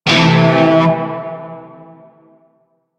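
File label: Shawzin Pentatonic Major Chord I; Music; Warframe; Narmer Shawzin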